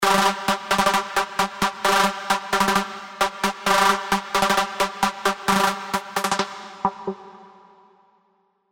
Overdrive Lite; Spire

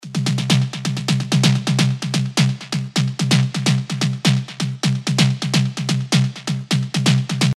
Tech Toms; Spire

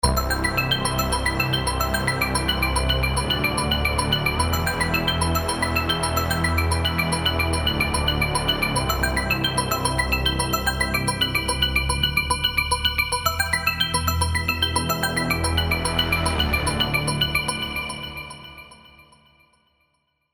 Serum arps; Please Slow Down

